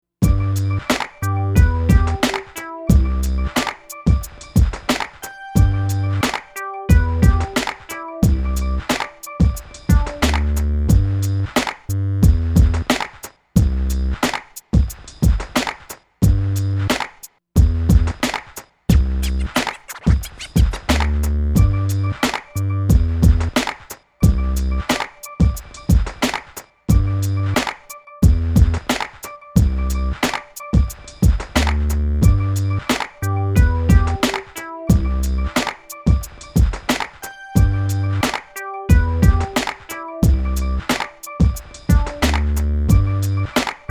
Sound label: HipHop